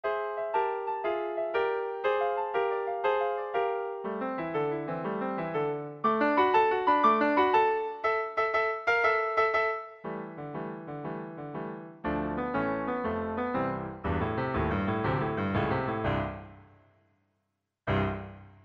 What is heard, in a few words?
satie sports chasse, piano